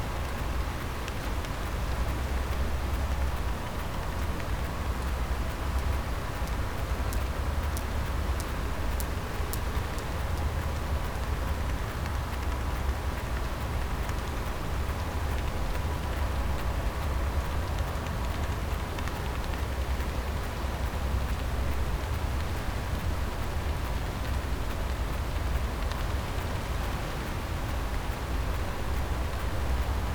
cachalot positif, Physeter Macrocephalus

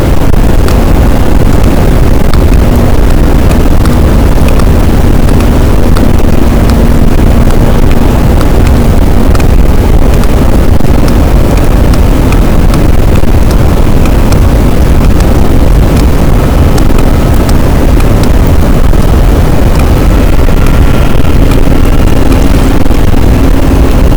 cachalot positif, boat, Physeter Macrocephalus

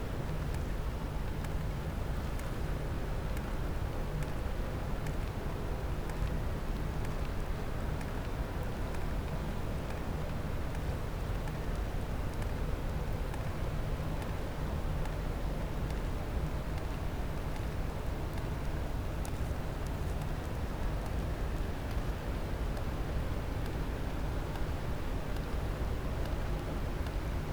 cachalot positif, Physeter Macrocephalus

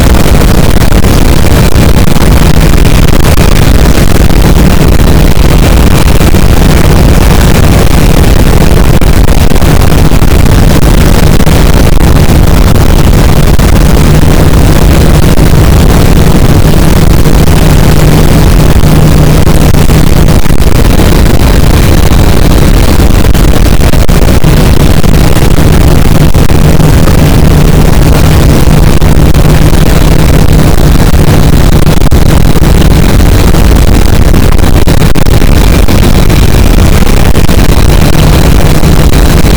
cachalot positif, boat; Physeter Macrocephalus

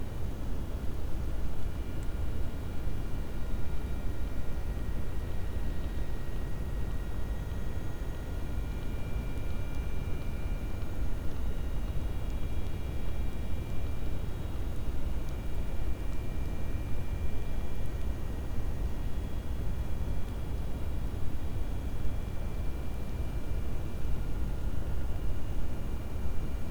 cachalot negatif, Physeter Macrocephalus

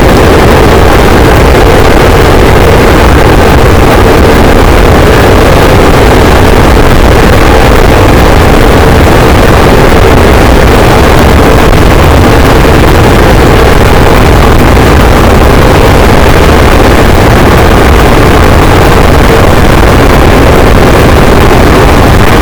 cachalot positif, boat; Physeter Macrocephalus

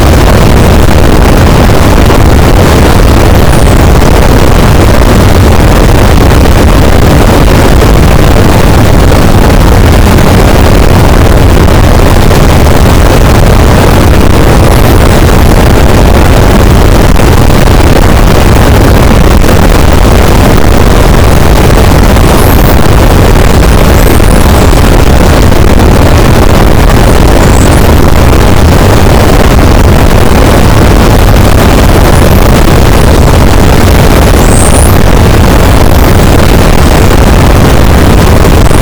cachalot negatif, boat, Physeter Macrocephalus

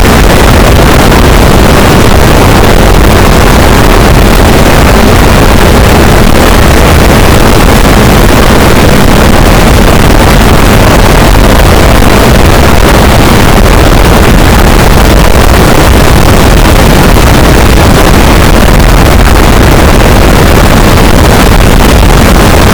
cachalot negatif, boat, Physeter Macrocephalus

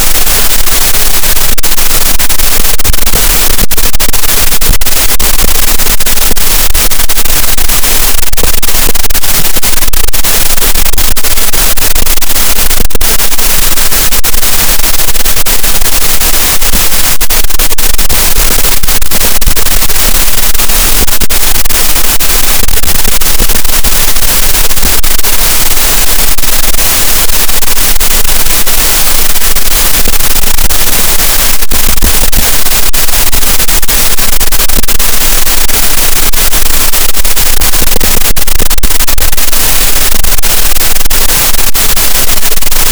cachalot, Physeter Macrocephalus, gaussian noise

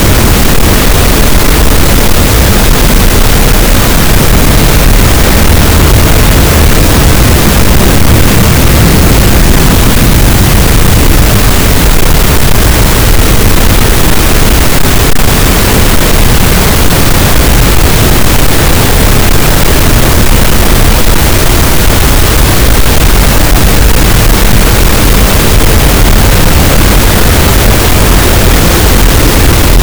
cachalot, gaussian noise; Physeter Macrocephalus

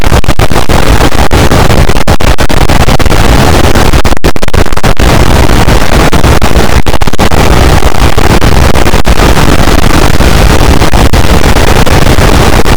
cachalot negatif, boat, Physeter Macrocephalus

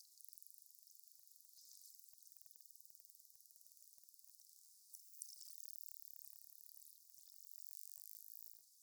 clics; globi

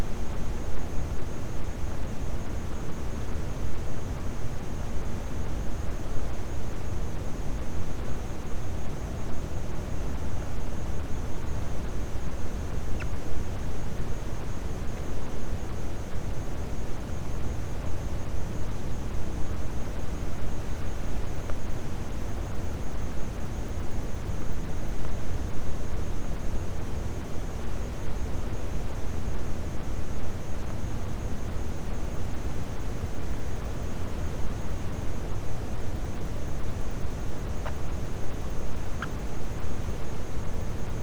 cachalot negatif, Physeter Macrocephalus

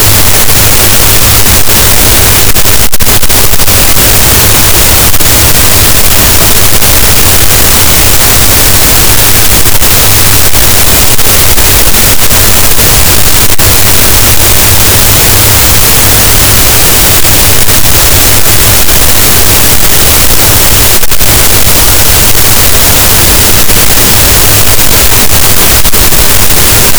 cachalot, gaussian noise; Physeter Macrocephalus

